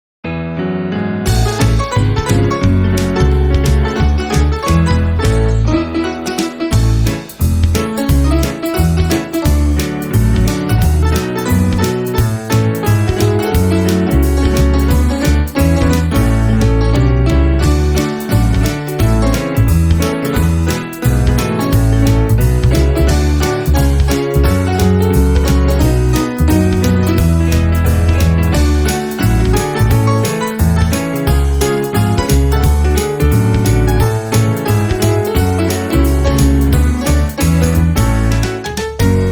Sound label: Piano Cover, 4 manos; Pony Music Archive